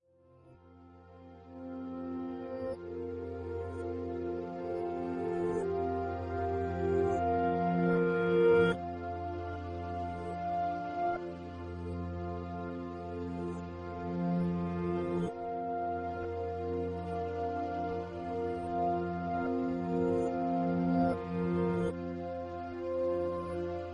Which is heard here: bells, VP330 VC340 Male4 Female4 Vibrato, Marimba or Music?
Music